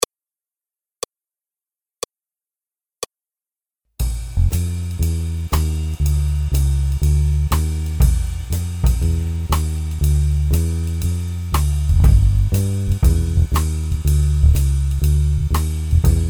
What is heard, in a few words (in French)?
Accompagnement seul, piano; Jazz